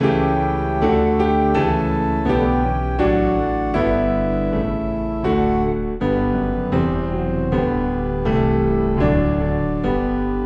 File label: Piano and Organ Low in G; Oh Sacred Head Now Wounded